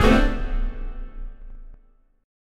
THUD FALL

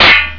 metal hit hard; Ferazel's Wand Demo

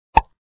pop sound; bubble; bubble t

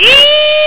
Steel; MarcDrive

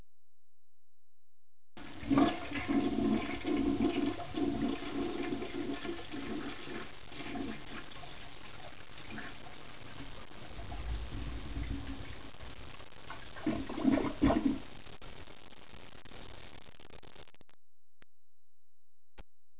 the flush

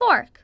fork; The Interactive Alphabet

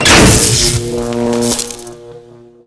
electrical switch down; mechanics; Spearhead Demo; MacFormat 2003 June

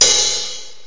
open hihat

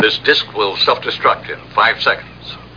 Disk

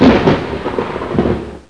thunderclap; meetmediaband

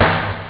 drums snare; OctaMED SoundStudio V1

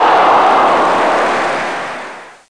oh mono; TotalSoccer

